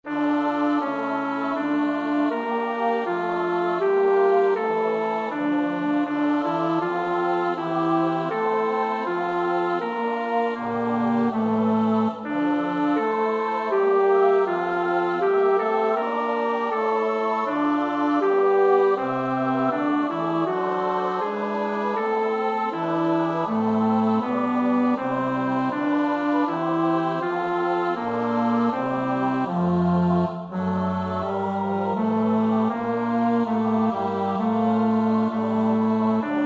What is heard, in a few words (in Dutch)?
bas; Bach (Johann Sebastiaan)